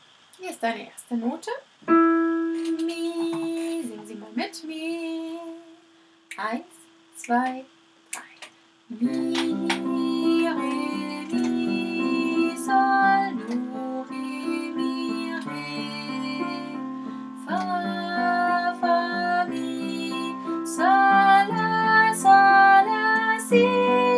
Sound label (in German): mit Melodie